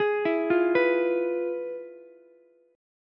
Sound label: F Minor Major 7th Flat 5th Ukulele; FmM7b5 arpeggiated; JGuitar